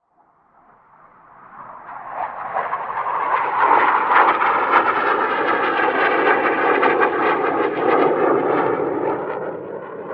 Jet Fighter F-16 Pass By Overhead; Airplanes; Sound Effects:Vehicles

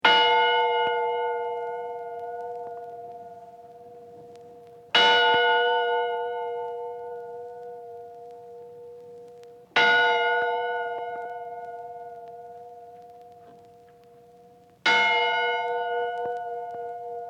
Bell Tolling Vintage; Bells; Musical

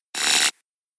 Spiky Laser Whoosh; Whoosh Sound Effects; Andrew Fleming

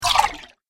Alien Water; Alien Sound Effects

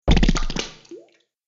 Muddy Puddle Splat; Slimy Sound Effects; sound design

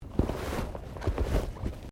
Body movement Clothing Foley

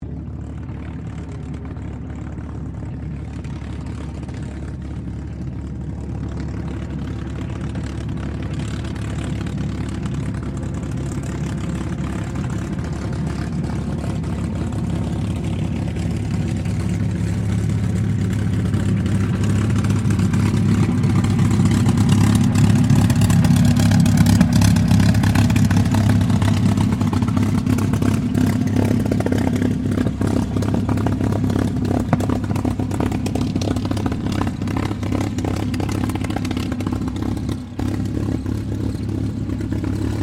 AIRCRAFT CAC CA-18 MUSTANG MK23 TAXI SHUTDOWN; CA-18 /P-51 Mustang; Airplanes (Sound Effects:Vehicles)

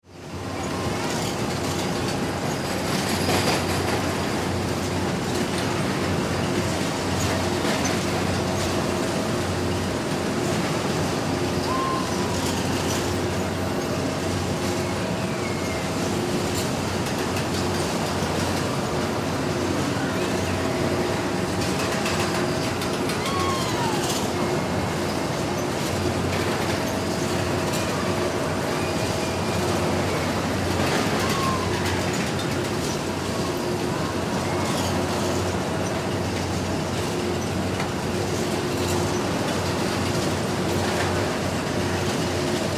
Ambience Carnival Rides; Rides (Sound Effects:Games)

